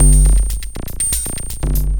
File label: Processed Beat, Drums & Percussion